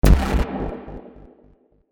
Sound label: Enemy Death